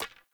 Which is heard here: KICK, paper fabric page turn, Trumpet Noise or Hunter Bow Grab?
Hunter Bow Grab